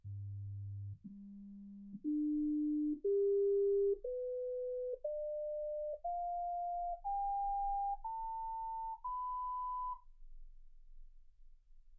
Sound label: sinus tones 48kHz